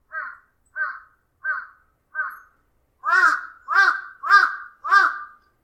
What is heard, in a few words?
crow